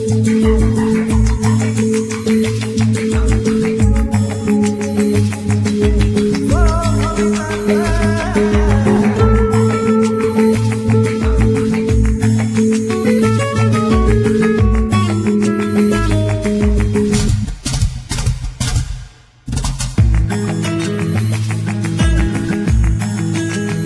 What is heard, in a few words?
Flamenco Chill